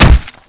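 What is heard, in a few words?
pipe wrench hit body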